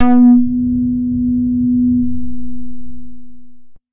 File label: 90s club bass B4